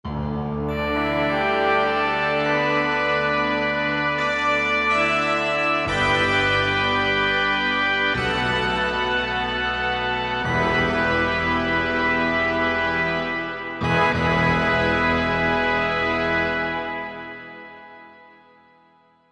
Glory Bell, Korg MSC-11S Brass

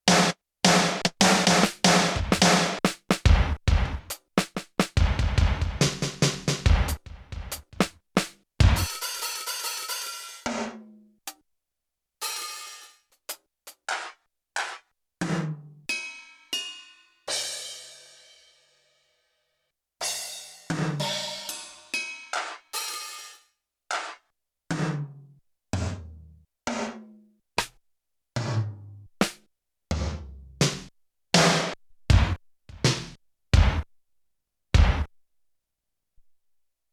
Multi Kit, Drum Kit, Yamaha TX16W

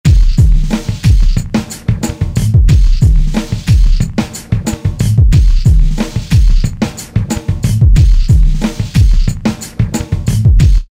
091bpm C4; Yamaha DJX; Voices

